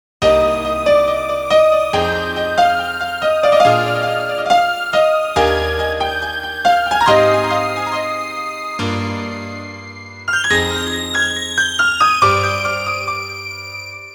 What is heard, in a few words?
C Minor scale